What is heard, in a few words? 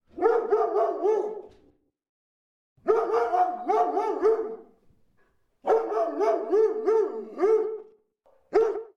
Small ambient dogs; STALZONE